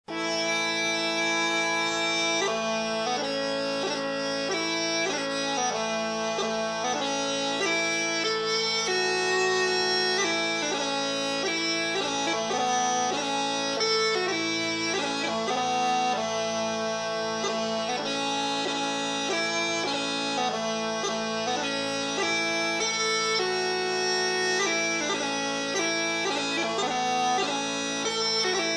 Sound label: Smallpipe